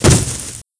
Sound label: Body Impact